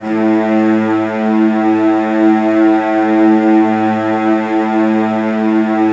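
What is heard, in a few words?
CELLOS B2, Strings; ILIO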